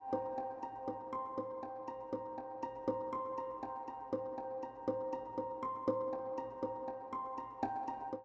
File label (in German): harfe atmo 16 beats